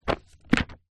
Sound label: household telephone directory yellow pages movement